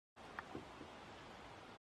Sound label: 9 banded armadillo noise